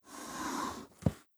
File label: popup pos open